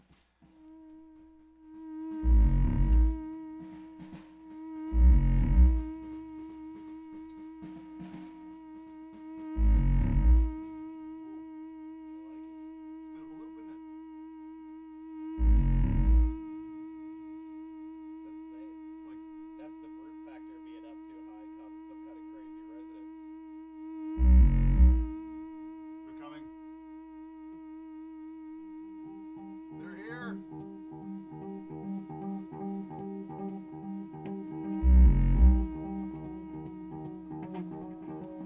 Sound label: noise